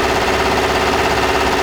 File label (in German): einsp, diesel; akustik